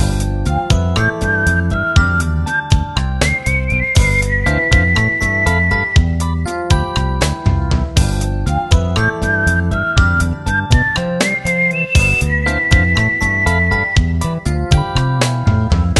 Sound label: loop